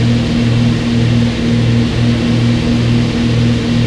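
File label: morava cockpit right, engines; War Thunder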